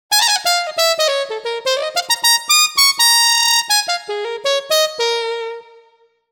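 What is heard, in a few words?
mute trumpet; TURBO VL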